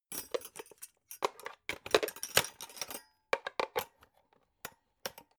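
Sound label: electric whisk patch handle, kitchen; haus-garten; kueche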